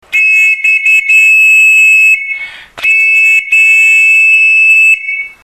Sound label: city whistle